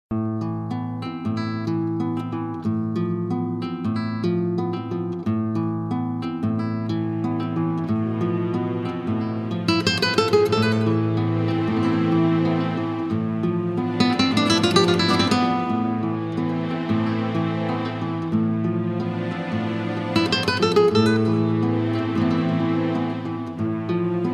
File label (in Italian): Chitarra Flamenco